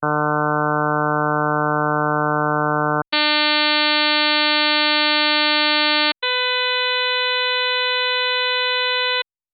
harm sinus 48kHz

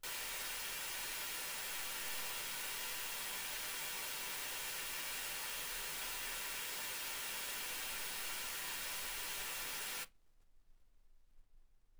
wgn 48kHz

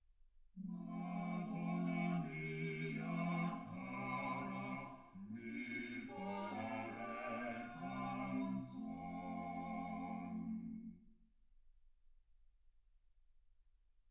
quartet

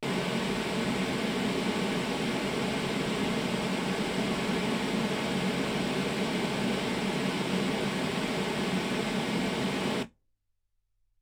pink noise 48kHz

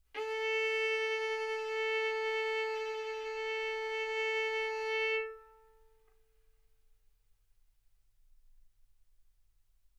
Violin arco A4 B4; mf; sul A; stereo

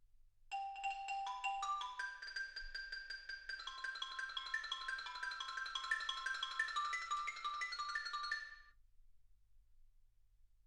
xylophone

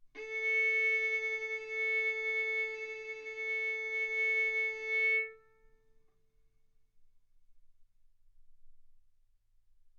Violin arco mf sul A A4 B4 stereo

